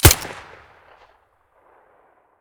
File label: silencer fiveseven stereo, weapons